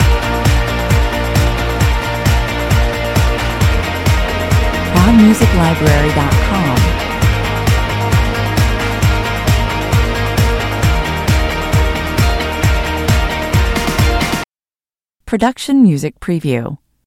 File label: fast car loop